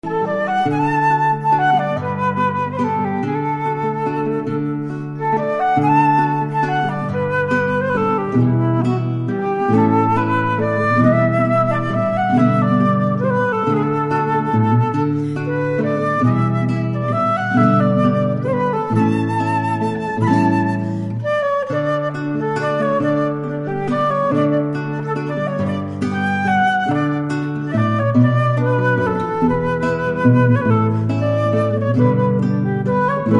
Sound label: Lovers Waltz